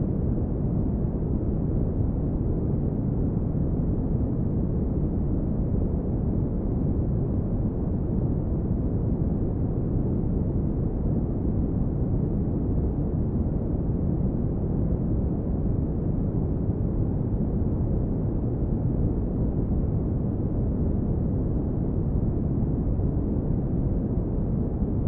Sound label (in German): Rauschwelten Tiefes braunes Rauschen; noise; Silentsubs